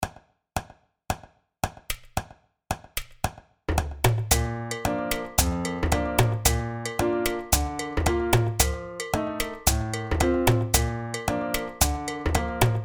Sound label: Backing Track; Tiritomba